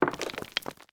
Ponte crollato; BLIND QUEST - The Enchanted Castle

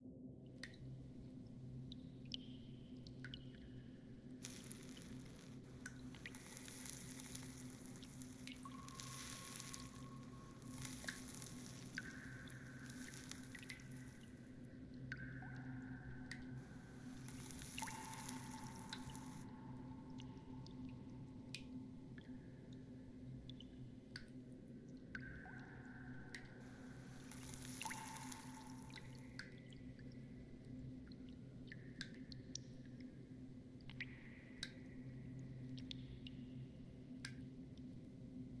AMB Segrete; BLIND QUEST - The Enchanted Castle

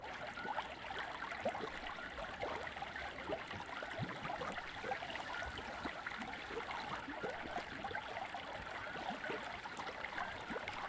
Palude; BLIND QUEST - The Enchanted Castle

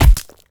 Radice Hit

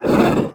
Spirito Della Vendetta; BLIND QUEST - The Enchanted Castle

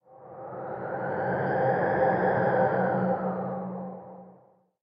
grotta buia; BLIND QUEST - The Enchanted Castle

